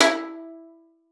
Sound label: E4, VIOLIN PIZZ; Xsample VOL-5; 90 s Sample CDs